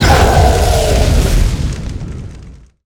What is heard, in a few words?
Darkness King death; Swamp Of Darkness